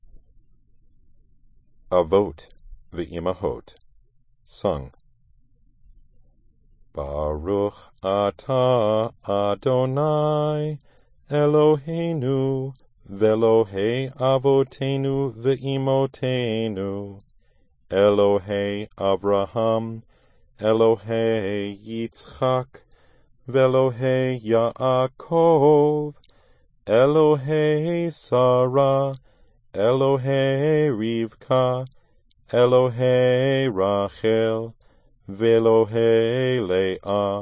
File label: Avot Vimahot sung